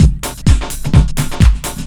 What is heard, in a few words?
LOOP; SoundFactory; DRUMLOOP